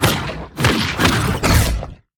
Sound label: enemy shadow evolved death; sfx